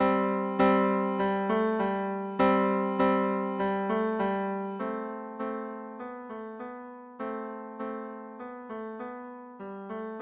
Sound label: piano